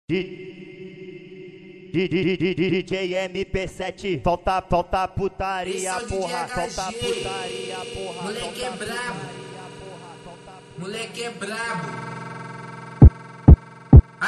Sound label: funk